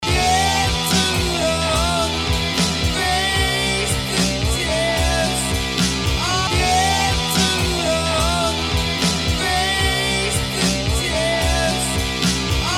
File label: tasty guitar loop